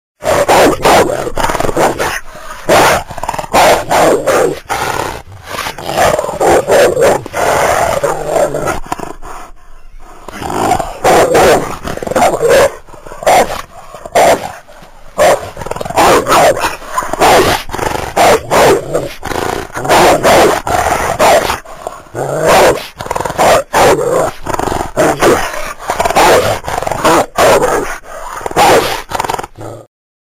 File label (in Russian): zlobnoe jaristnoe rychanie